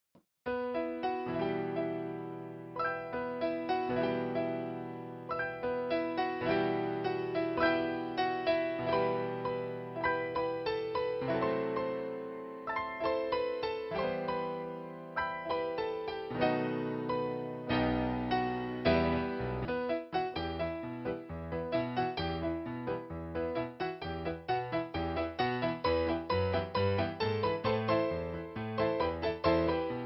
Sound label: Bella Ciao karaoke